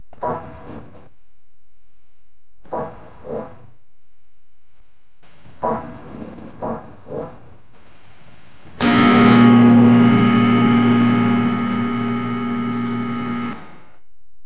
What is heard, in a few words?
short sound of success; achievement